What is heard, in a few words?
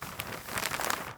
move paper